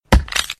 scream kill

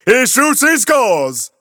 grooves positive; tf; a hat in time

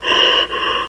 breath alert; tf; slender; shape

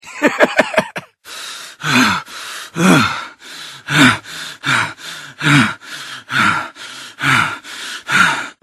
cannibal idle; frank

